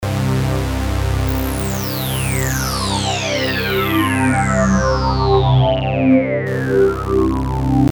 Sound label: sweep reaktor